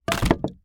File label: wood